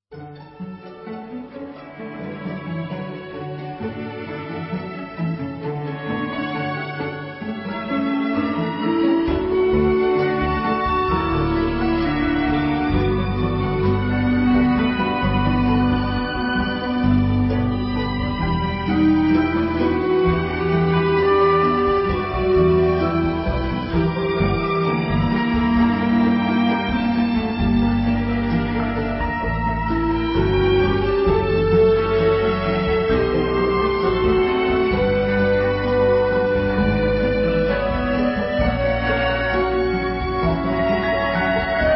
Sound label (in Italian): Pizzicati E Altro